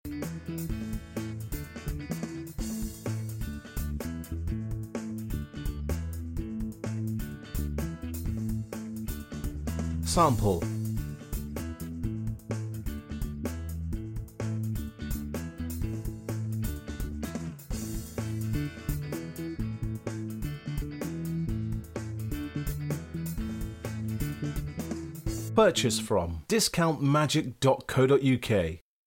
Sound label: Upbeat Groove